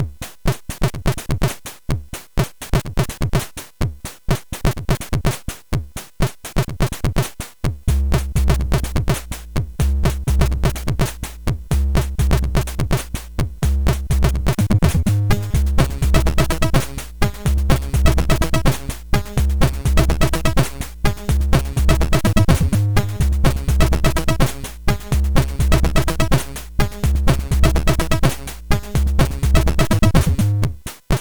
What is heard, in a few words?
Chill, sid CSG8580R5; hvsc